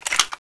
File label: reload insert; weapons; bouncer; cstrike